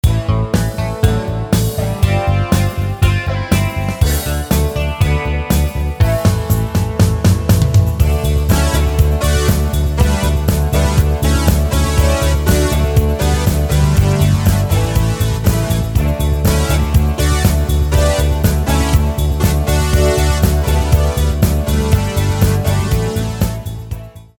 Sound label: Jump Rock, Yamaha PSR-550